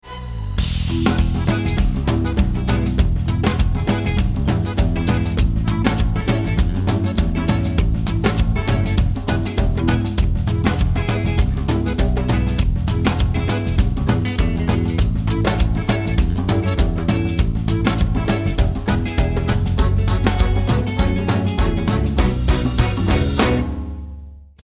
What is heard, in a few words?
Zazu Accompaniment